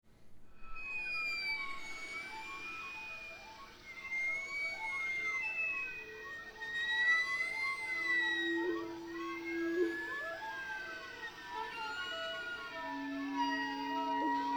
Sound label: Floating